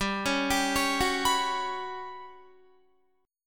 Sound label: G Major 7th Flat 5th, Guitar; GM7b5 arpeggiated